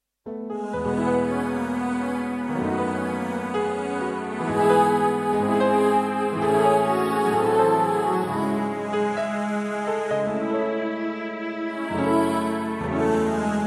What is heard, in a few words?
Instrumental